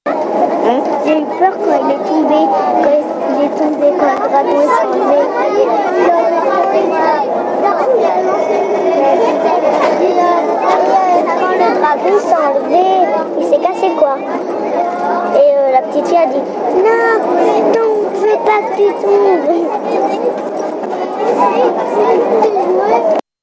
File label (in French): son bus; Ecole et cinema; la Gallissonnière